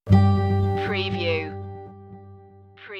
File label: summer morning light stinger